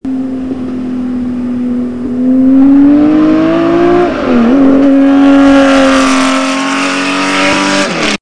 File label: porsche 917